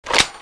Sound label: speargun draw